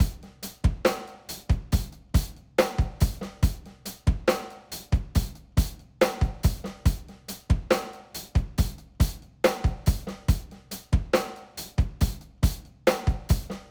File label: rock